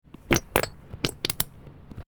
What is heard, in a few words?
refreshing morning fart